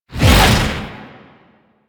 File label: Heavy Punch Whoosh; Enemies; Abilities; Warframe; Duviri; Duviri Dax